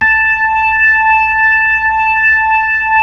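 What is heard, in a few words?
B-3, Hammond B-3 organ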